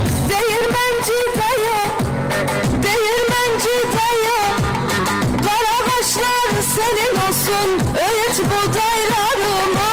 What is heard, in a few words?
bass